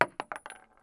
Inf Weapons; Red Orchestra 41-45

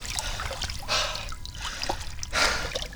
water choke loop

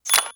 Kill Ping; Titanfall 2; Minion

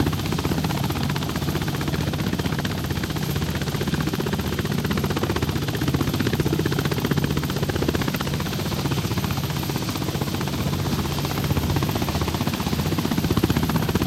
apache engine work onload high, tanks; War Thunder

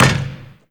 basketball backboard; trainer; Call of Duty Modern Warfare

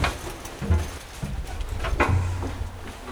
Vehicle reloads; Red Orchestra 41-45

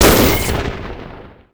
drone explode

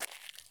walk asphalt; Escape from Tarkov

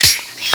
adrenaline inject

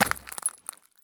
sprint glass; Escape from Tarkov

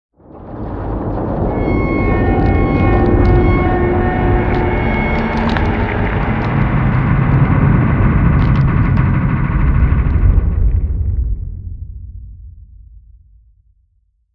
damage vehicle; World Of Warships

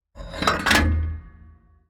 gun reload; World of Tanks

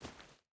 footstep barefoot stones walk; Rust

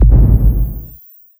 interface enter

